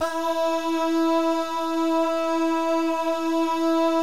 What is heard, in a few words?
BAH GUY, Vocal Planet Jazz & FX; ILIO; BAHS GUYS